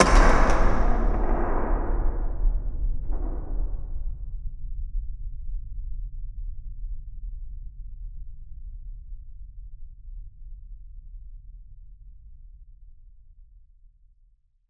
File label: Roll Off Into Nothing, Cinematic Metal Impacts